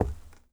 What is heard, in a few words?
FOOTSTEP Trainers Wood Boards Walk mono; Phasmophobia